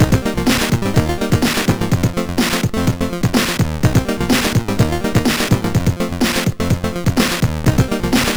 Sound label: Game Musix sid MOS6581R3